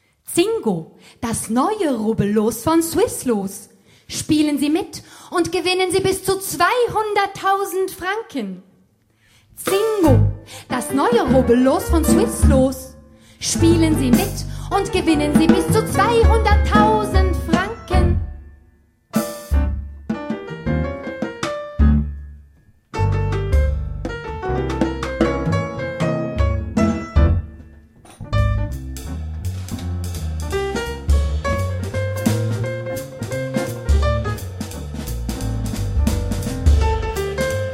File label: livejazz; Zingo Rubbellos